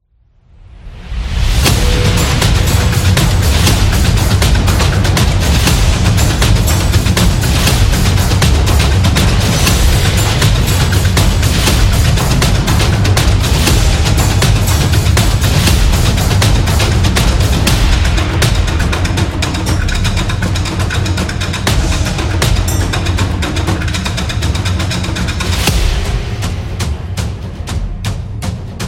Epic Intense

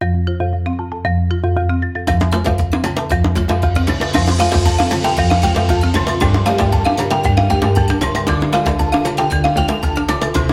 Happy African Background Music

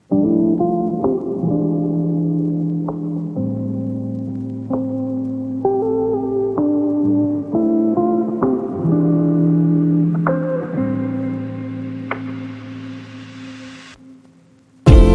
Chill Jazz Lofi

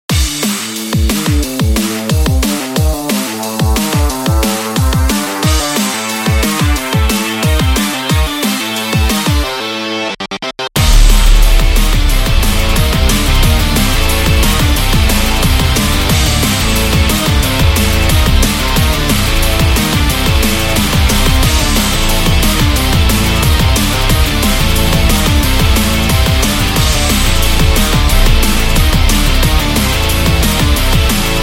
Hard Rock Action

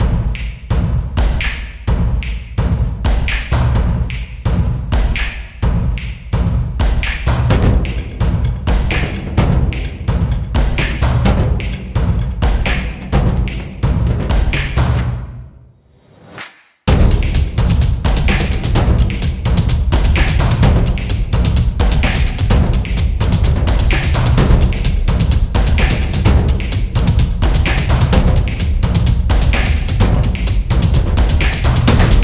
Rhythmic

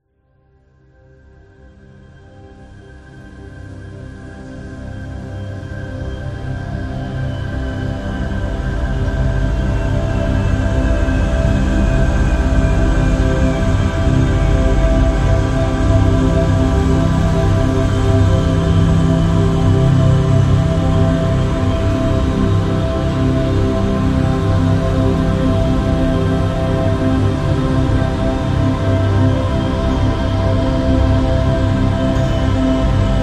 Theta Binaural Meditation

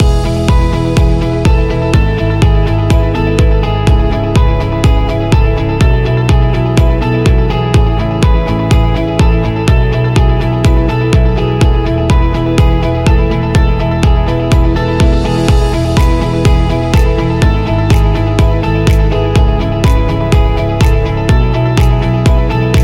Business Loop Corporate

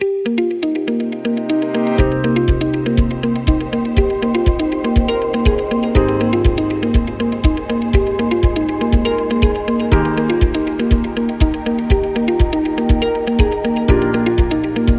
Commercial Corporate; business background

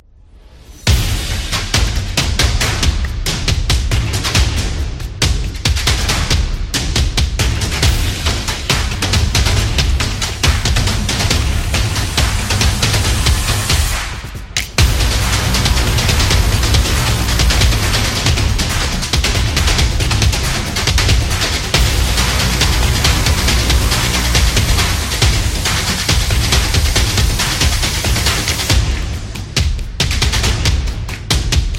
Dynamic Trailer Intro

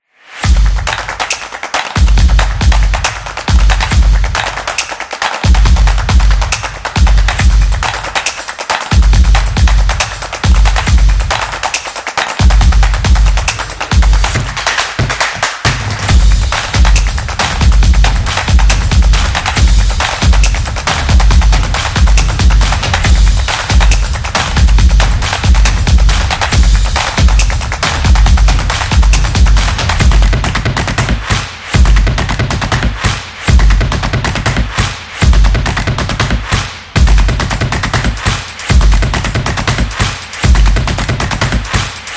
The Drumming Vlog Opener

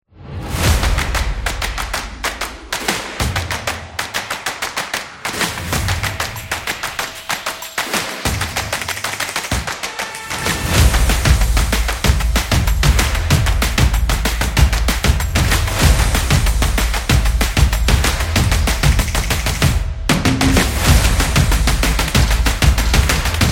Stomp Promo

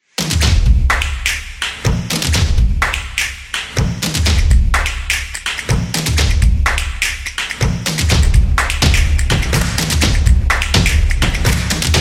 Drum Action

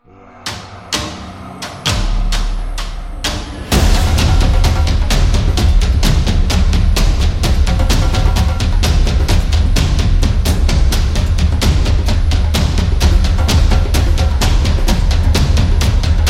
African Documentary

